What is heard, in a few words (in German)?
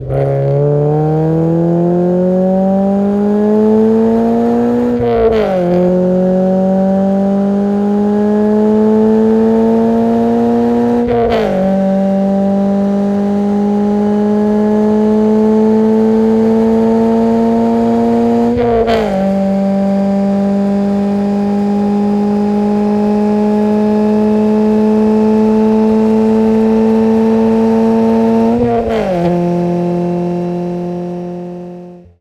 Sound, auspuff